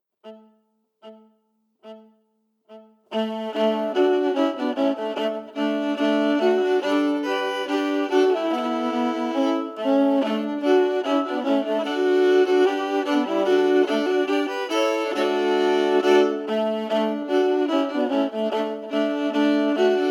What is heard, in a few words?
Pressley Manor harmonies emphasized